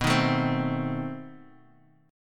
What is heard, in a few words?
B 7th Flat 9th B down Guitar Half Step Down; chord shape